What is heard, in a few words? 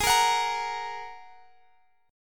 G sharp 7th Suspended 2nd G sharp; G#7sus2; x,23,20,23,23,x; strummed